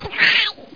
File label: die; gubble